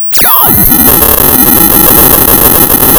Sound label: pop, tearing paper